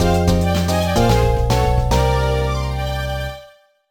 Jingle CLEAR; Puzzle Series Vol. 5 Slither Link

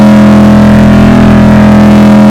shelby off mid

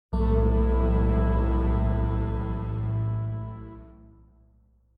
Wave Beat

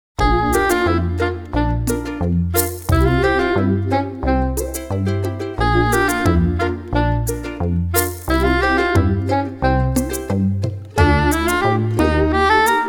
flipped clarinet; zukkoke kurarinetto

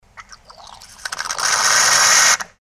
SFX coffee machine steamer

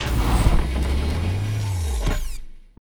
wing unhide

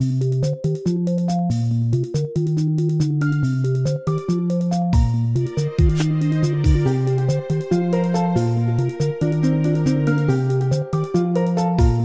Light Menu; super sweet boy; Cotton Alley

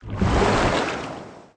Soda Wave Out

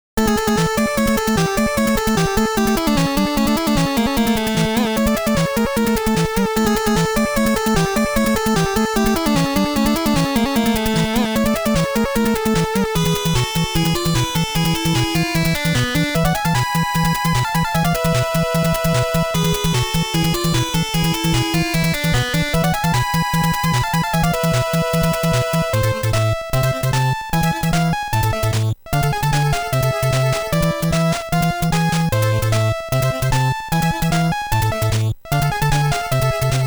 BGM ~ Game Over

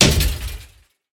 Collision Trash Can

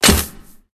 Character Body Impacts

